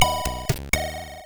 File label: sid CSG8580R5